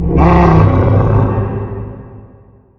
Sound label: empower cast; dota; magnataur